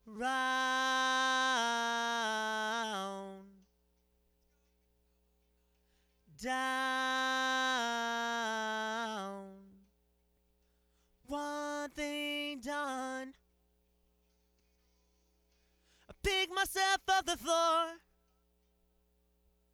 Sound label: Vocals